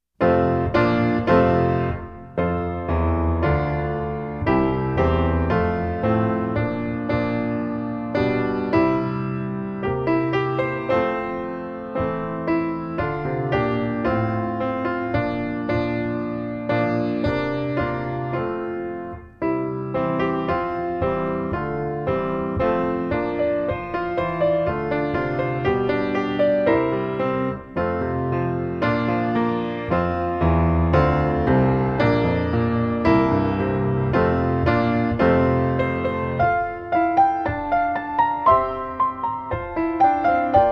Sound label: Simple Gifts Accomp, trumpet; Hal Leonard - The Canadian Brass Book Of Easy Trumpet Solos